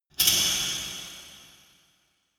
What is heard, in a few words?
dark resonator hit double hard